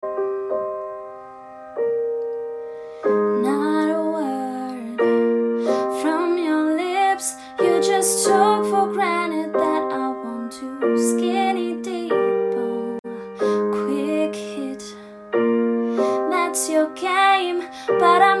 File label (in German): Gesang Ballade